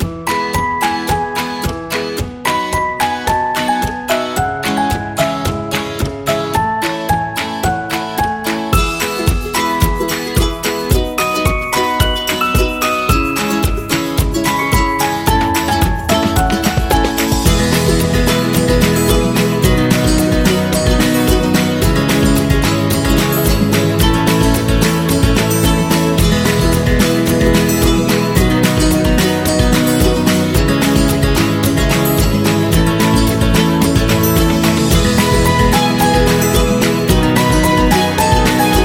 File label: Food Happy